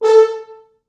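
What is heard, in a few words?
4 French Horns, Horns; A3; East West; Quantum Leap Horns Sax; 4 FrHorns MOD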